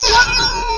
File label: spark beam; Enemy SE; Nintendo; July 2020 Gigaleak